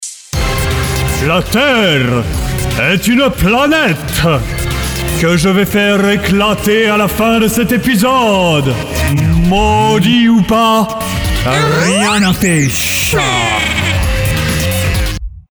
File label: duck